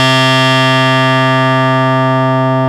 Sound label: WAVESCAN; Rubber Chicken Software Sample Bank